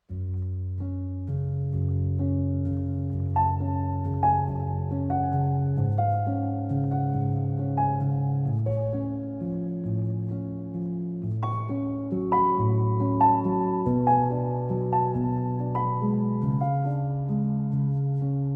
threnody arr for piano

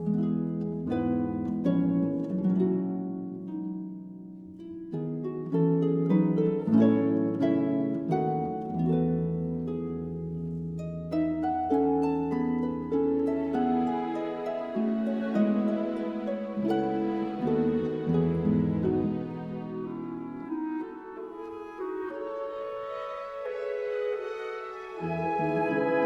in g major, andante sostenuto

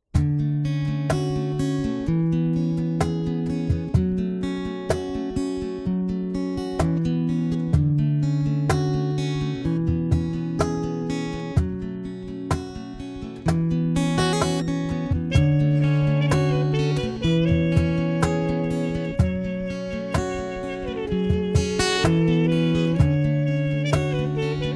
acoustic version

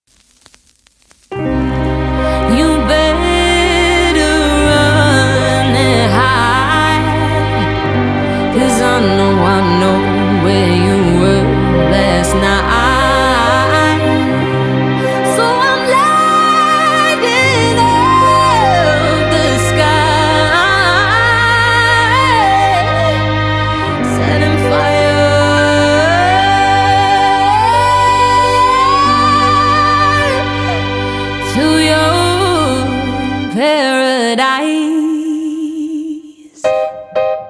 live acoustic version

